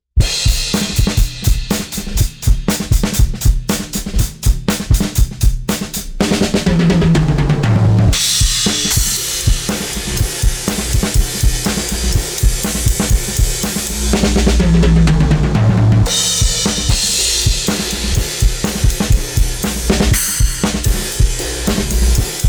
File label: drum; COSMOS